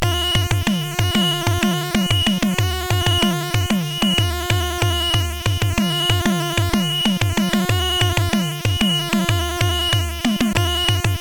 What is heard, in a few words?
Jungle Sfx, CSG8580R5